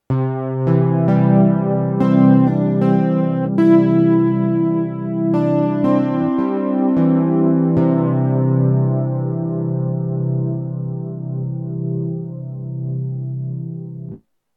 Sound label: chorus